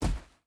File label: footstep hide sand jump land; Rust